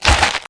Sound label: bod strk, bugs; Starship Troopers